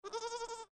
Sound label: goat bah